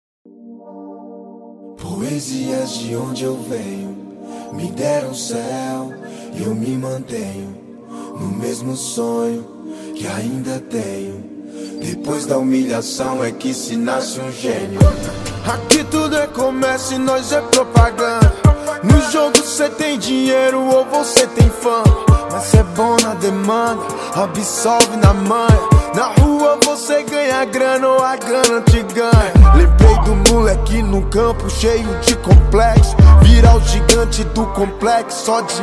rap; cd rap flash sound

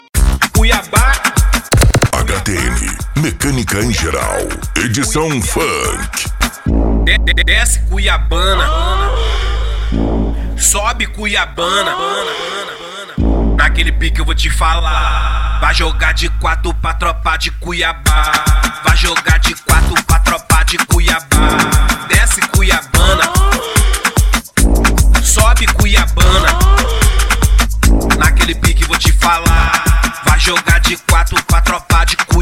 mecanica em geral edicao funk

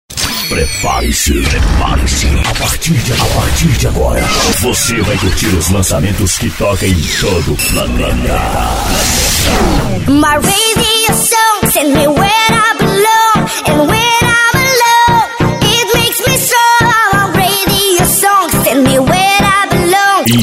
dance; impacto som

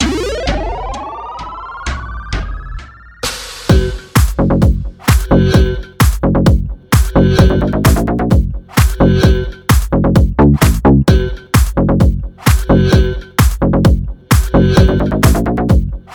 tum dum berimbau; pack de base de mega funk

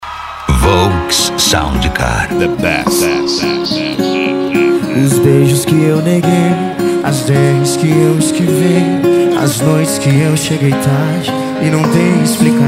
sertanejo e pisadinha; cd volks sound car

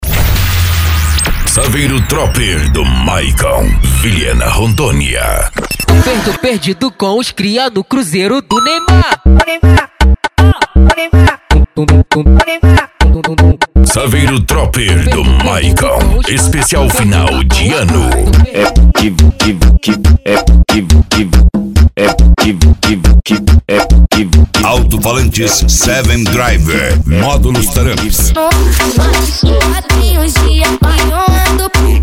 funk agressivo funk

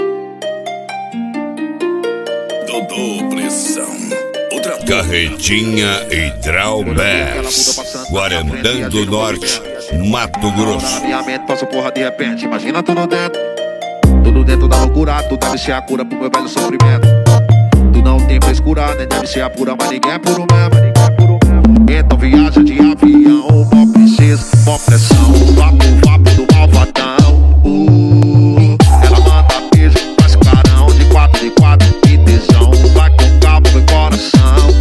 piseiro

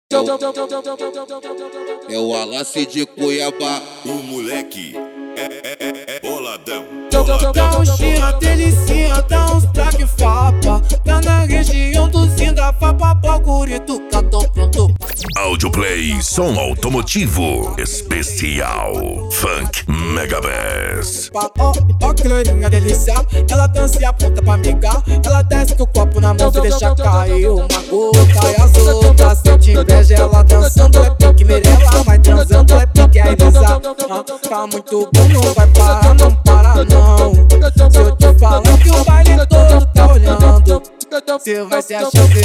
esp funk mega bass

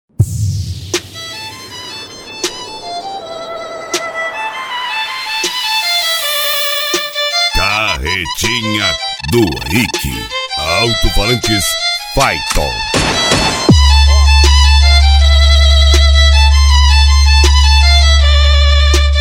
violino; carretinha do rick

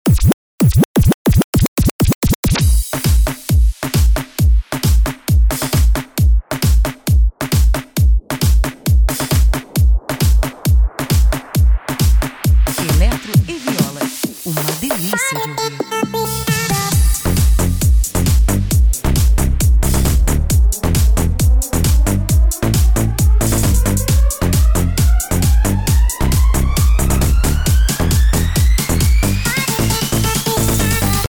eletro e viola especial de eletro funk

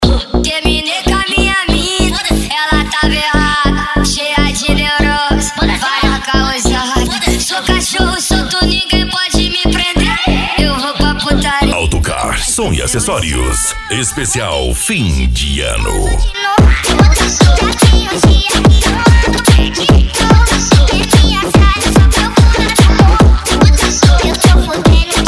funk agressivo ritmado e funkadao

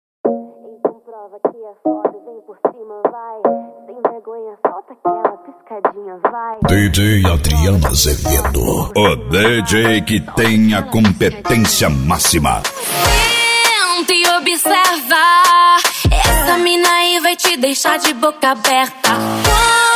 funk e sertanejo

